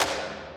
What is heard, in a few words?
orch percus